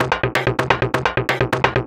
Perc 128-BPM, Percussion Loops; Zero-G; Club Techno; Drum Loops

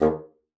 bassoon, D#2; Xsample VOL-6

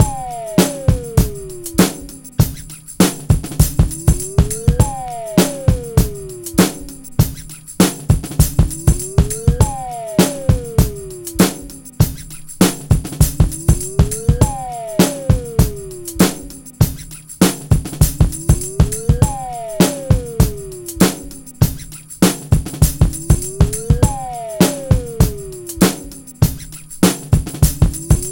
BREAK 100 bpm; WINDOW BREAK; 90 s Sample CDs; Planet of the Breaks

